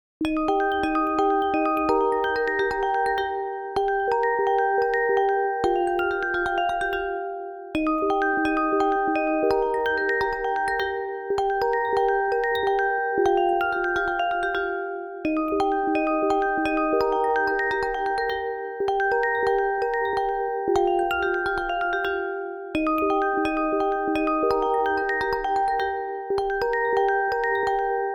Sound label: Fairytale Ringtone